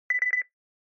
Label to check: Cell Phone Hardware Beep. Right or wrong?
Right